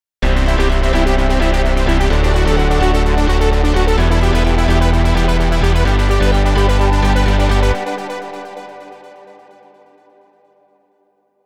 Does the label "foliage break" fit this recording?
No